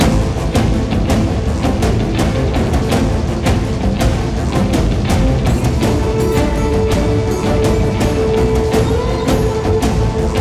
High Threat Loop; Route99

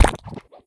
Honey Tile Splat; gamerip; Candy Crush Jelly Saga

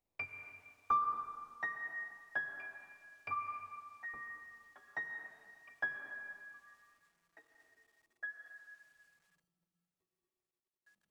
One shot Piano; Zombie Army 4 Dead War

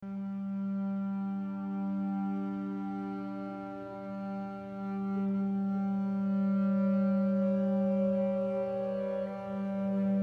Cello and Guitar String Loop; Cello and Guitar Giraffe String Loop

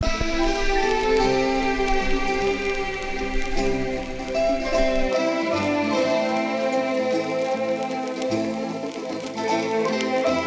Orchestral

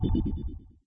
Frog Selected; Candy Crush Saga

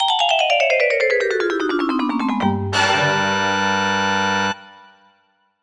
Haunted Lose

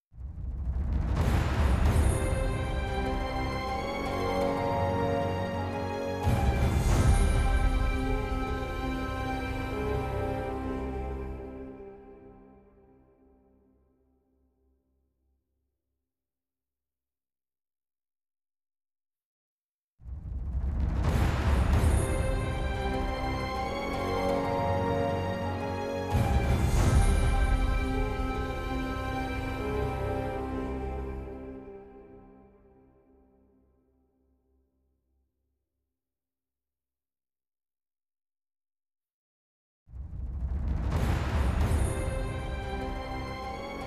Positive Event Greek, soundtrack; Troy Musou; PS3

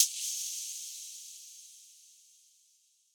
Tension Snap

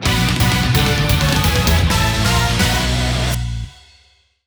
Rock Jingle; Penny-Punching Princess; 2018 ps vita switch

